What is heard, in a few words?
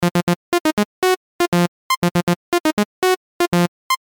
synth arpeggio; analog synthesizers